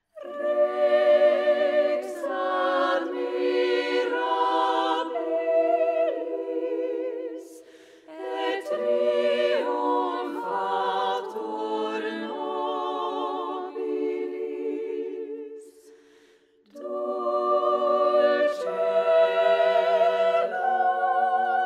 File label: Morning Hymn Alleluja